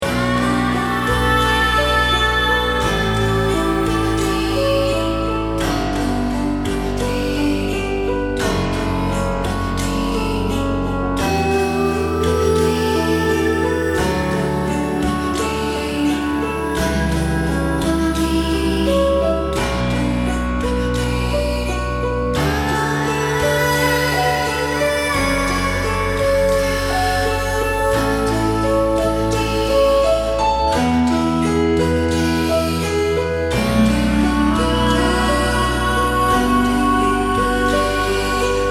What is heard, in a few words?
Healing Melody